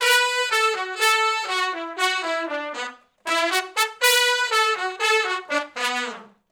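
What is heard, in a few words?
R&B Riff (B) unison, Horncraft; Sony Creative Software; Crimson Blue and Fabulous Horncraft 4 RnB; Horncraft for R&B; Sections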